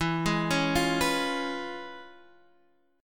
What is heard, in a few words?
C sharp 7th E; C#7/E; arpeggiated; JGuitar